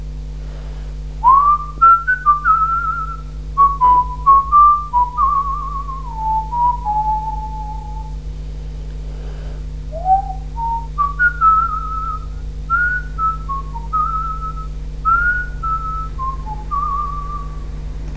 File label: whistling; Mini Recorder 2.1